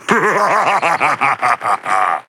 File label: Laugh; Paladins; Makoa